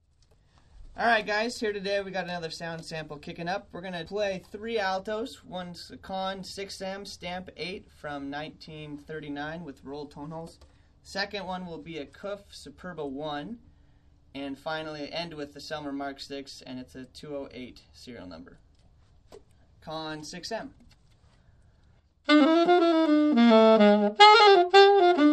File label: ALTOS x3 selmer mk vi; couf